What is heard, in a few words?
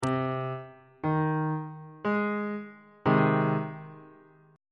g sharp major 1st inversion on bass clef